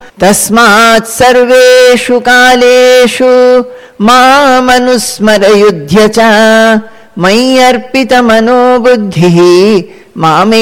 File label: Chanting; bhagavad gita; chapter 8 aksara brahma yoga; verse 7